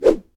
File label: fists; Original TK Sounds